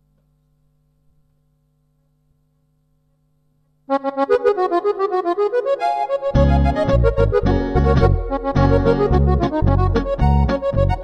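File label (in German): Polka für Akkordeon polka za harmoniko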